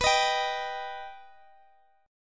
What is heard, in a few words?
B Augmented, Guitar, Open D